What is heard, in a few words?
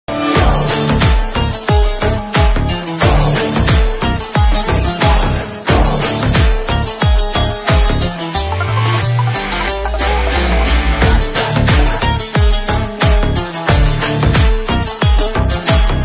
bgm tune ringtone kannada movie bgm tune; ghost